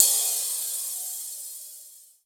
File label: Long Chorus, Hats Samples; Drum Hits; Nu Dance Electro